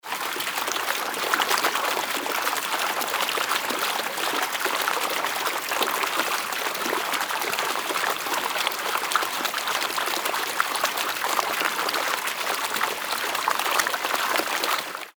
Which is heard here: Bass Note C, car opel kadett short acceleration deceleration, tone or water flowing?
water flowing